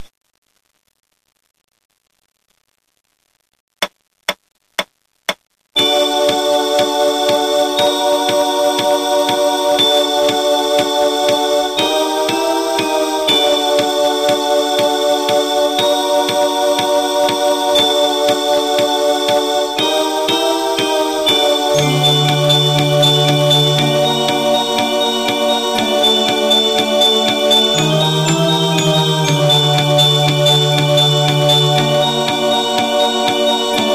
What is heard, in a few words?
instrumental